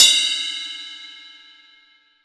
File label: 20 CUP, 20INCH SAB RIDE